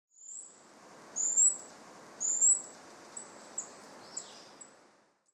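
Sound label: Eurasian Treecreeper; kibashiri; asahikawa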